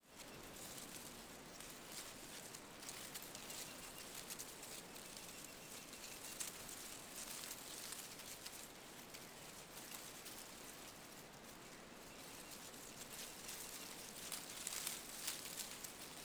Macaco movimentando folhas secas de arvore rio longe passaro

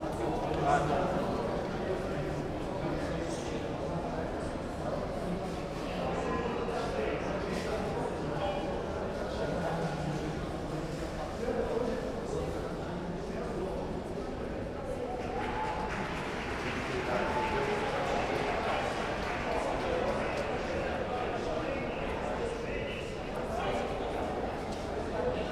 Ambiencia anexo 4 camara dos deputados hall com pessoas, walkie talkie e; Ambiencia anexo 4 camara dos deputados hall com pessoas, walkie talkie e palmas ao l

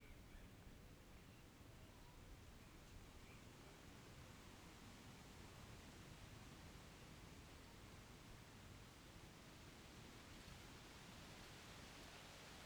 Ambiencia rural a tarde ventando nas arvores com passaros longe

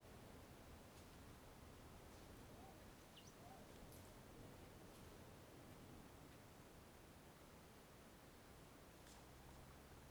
Ambiencia rural a tarde com passaros, estalo de madeira, pouco vento; motor

